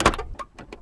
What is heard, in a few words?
door op; Mafie 1